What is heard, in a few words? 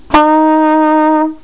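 flugelhorn, notes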